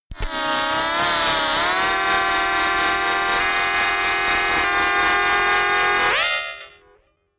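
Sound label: slow chord riser, science fiction; sciene-fiction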